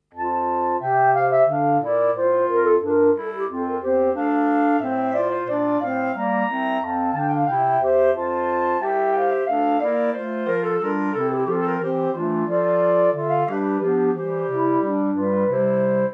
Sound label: horn